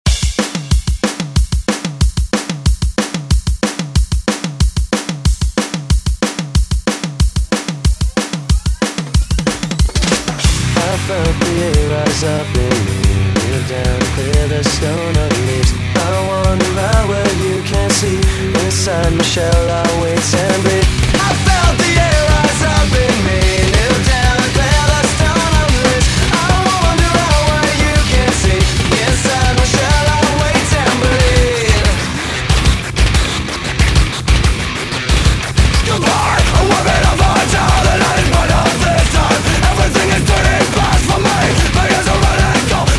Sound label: 90s ReDrum; DJ Mhark